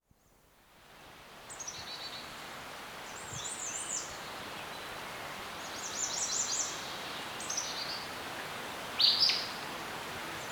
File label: Birds Chirping In a Serene Forest; Dr. Sound Effects; 210 Nature Sounds - 20 Hours of Relaxing Natural Ambiences for Meditation and Sleep